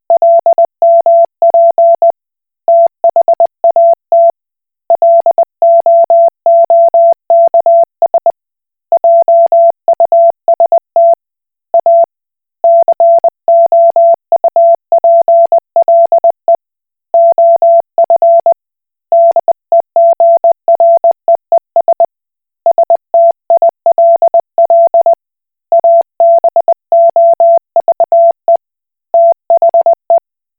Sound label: Koch Morse